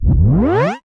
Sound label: Tape Start